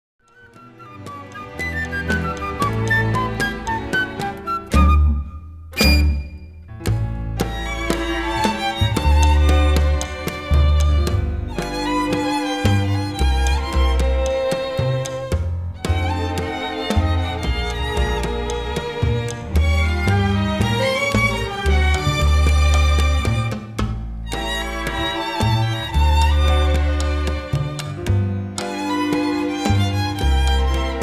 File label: danzon